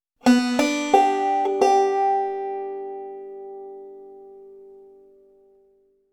der galax lick

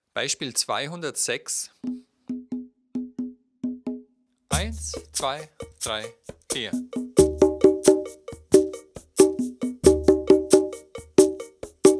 dreierrhythmus

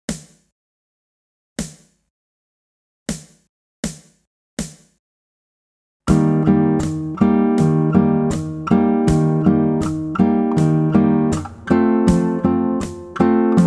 string clicking langsam

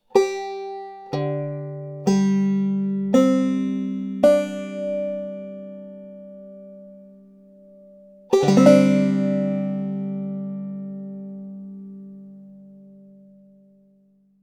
das sawmill tuning

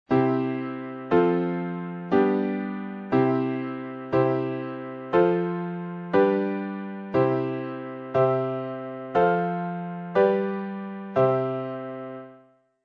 die vierstimmige hauptkadenz

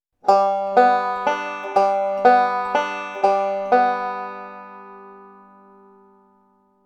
forward roll schema

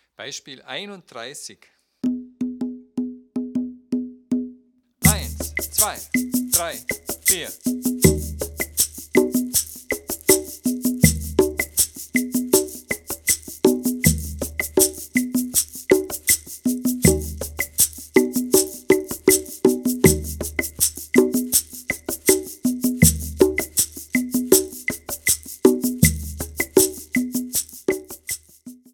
viererrhythmus

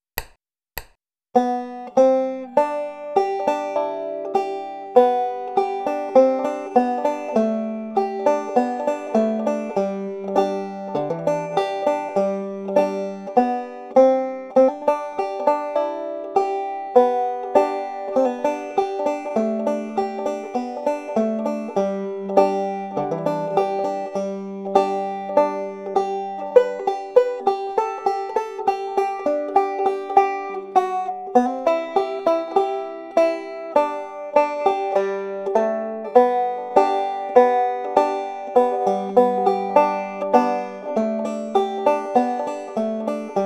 wildwood flower mit hammer on und pull off

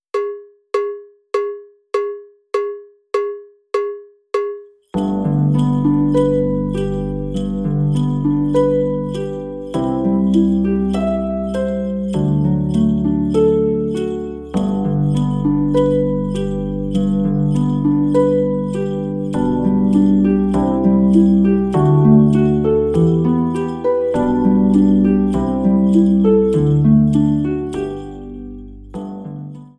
lasst uns froh und munter sein slow

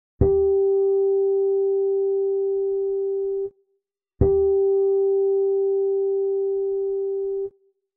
Flageolett

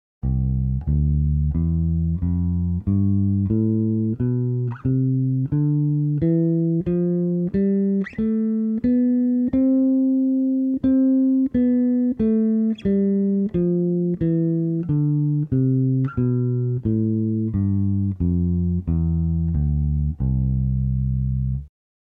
dur tonleiter 2 oktaven

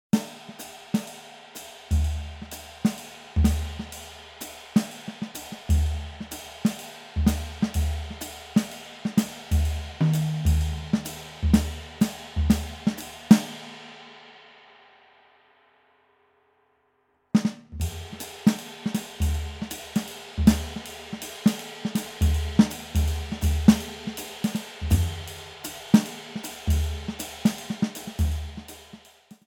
swing rhythmus

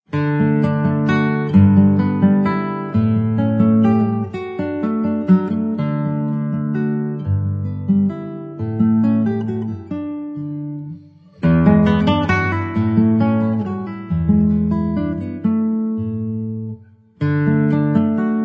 laendler d dur; joseph kueffner

